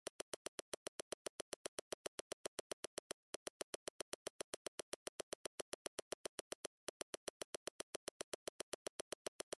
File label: card machine